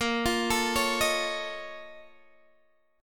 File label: A sharp Minor Major 11th, A sharp, down, Guitar, Open D